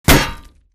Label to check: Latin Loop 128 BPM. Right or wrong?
Wrong